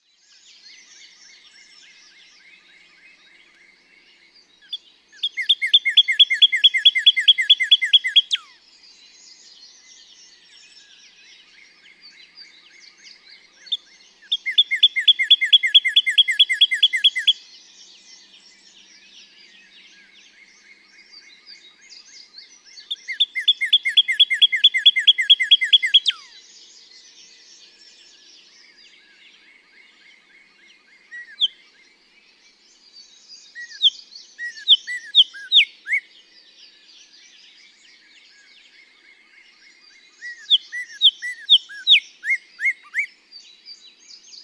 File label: Northern Cardinal